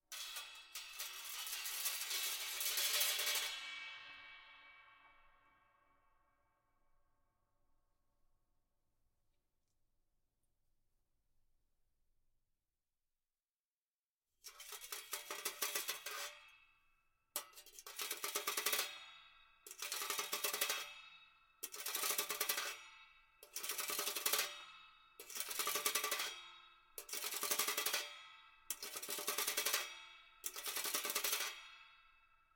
Metal Carbon Steel Cheesegrater Scraping Reveb OmniMic; Guild Wars 2 (Original Foley Soundtrack)